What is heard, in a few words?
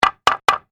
Industry Hammer Wood Shim Adjust; Hammers; Tools